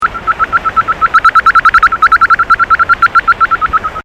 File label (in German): Hufeisennase Ortungsruf heterodyn